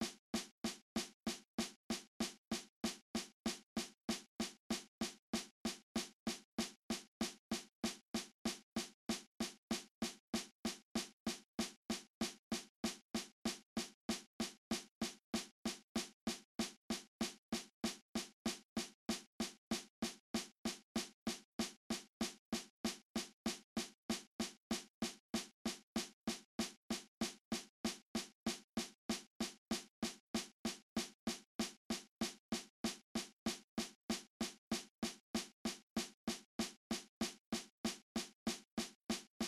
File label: Just a Closer Walk cut time baritone; senior high band